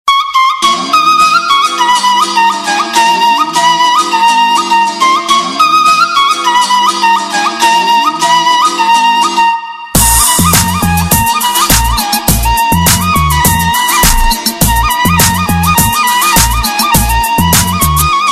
SINDHI TONE FLUTE RINGTONE

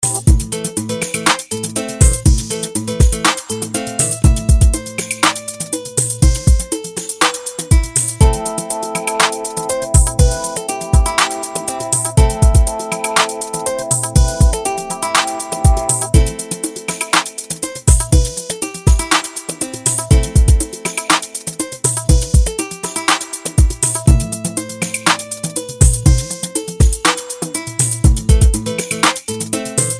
ANDROID MIX RINGTONE

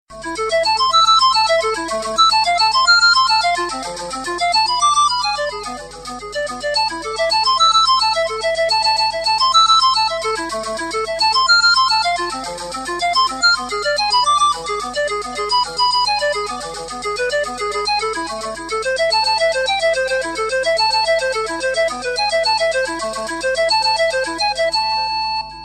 IPHONE 5 ROMANTIC FLUTE RINGTONE

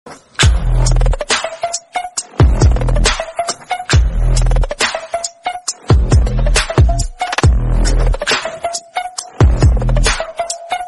instrumental ringtone

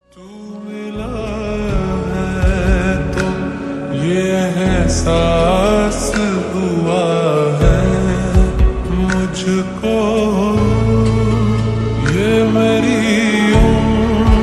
SLOWED REVERB RINGTONE

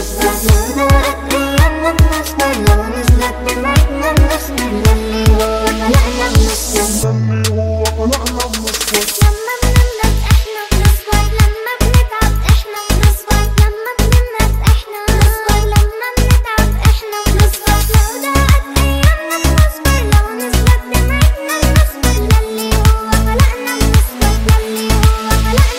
Arabic remix ringtone

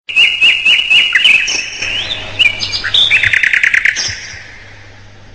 morning bird alarm ringtone

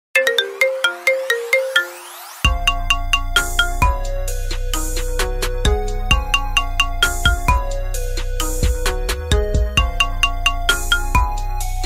IPHONE RINGTONE REMIX

